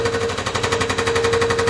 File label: Saw Hammer Loop